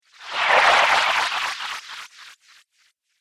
Rpg Spell Dispel Water Elemental; Magic

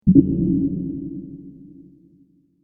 Space Style Ambient Noise, Eerie

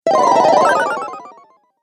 Retro Videogame Fx Falling

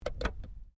Petrol Cover Close, car; Hyundai Accent 2008; Hyundai hire car; Vehicles

